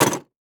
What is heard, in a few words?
Industry Tool Pliers Place In Tool Box